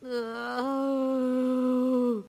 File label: Groan; Peridot